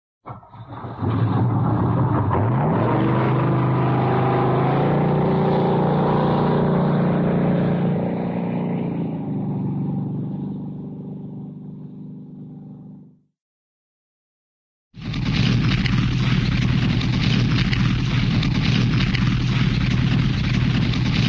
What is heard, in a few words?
boat, jet start and pull away; cartoon